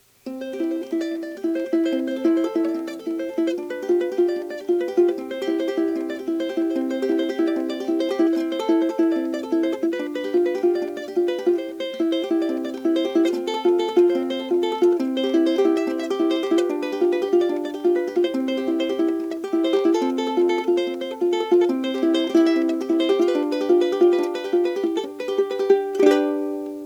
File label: Ukulele; CAmG7CamG7FCG7FCG7G